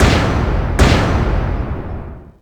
not guilty

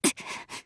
grunt

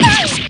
damage; celica

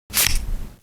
business card; retinz

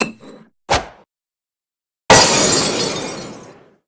wine throw; barok

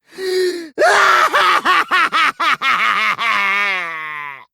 cry; kokichi

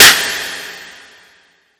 clap echo; nahyuta

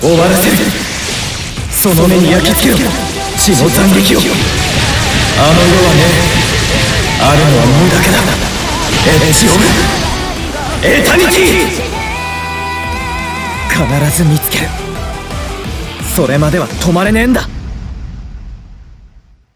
astral; kurogane

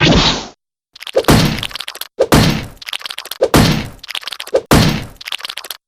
eel chop

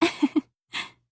laugh

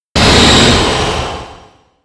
divine start loop; izanami

